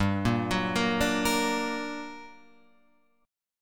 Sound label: G Minor Major 7th, Guitar, chord; GmM7 arpeggiated; Open F; JGuitar